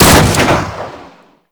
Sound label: Sniper Rifle Shot; Warframe; Weapons; Tenno